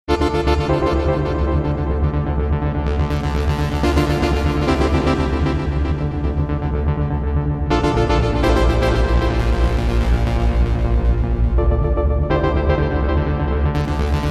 Korg T1 LFO Delay Intro

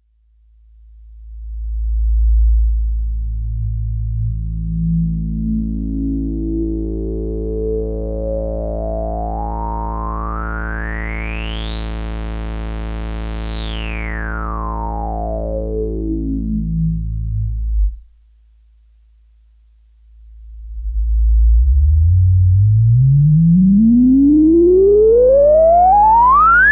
Filter Sub37

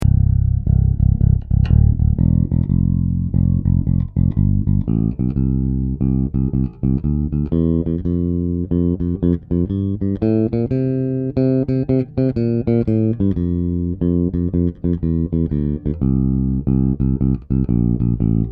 Peavey Headliner 1000

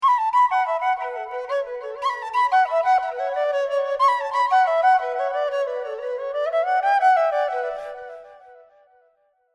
Flute Echo

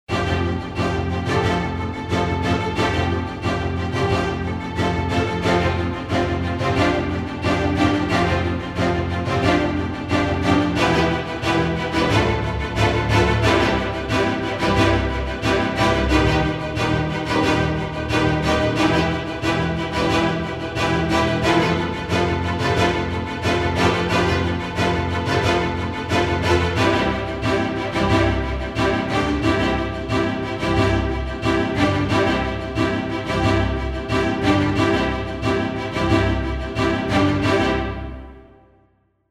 Orchestra; Sonuscore Orchestra Complete 3

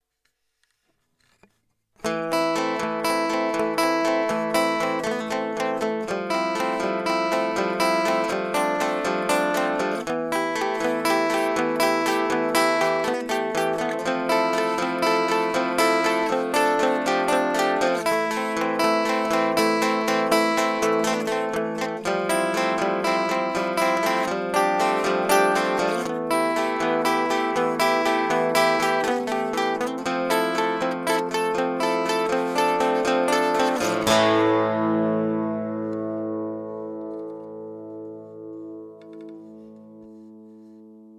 Travel Guitars

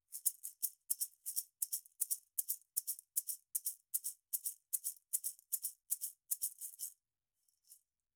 Shaker; Brauner Phantom

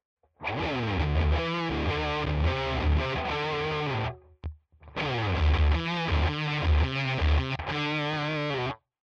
LifePedal Symm Octave max OFF ON

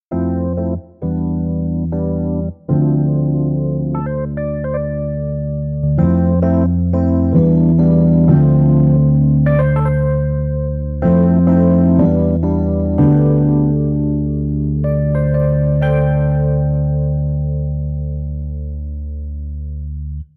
Blues

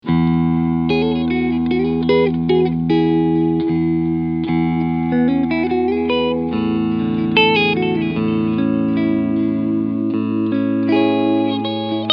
Clean Position 4 Switch 2

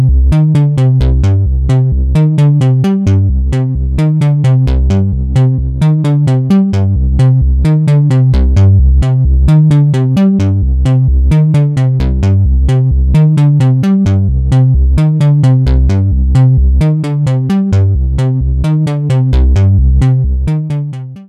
Modal Argon8

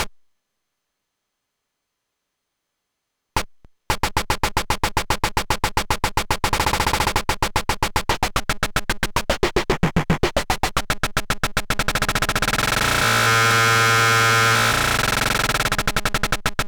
Twisted Electrons deton8 Stutter

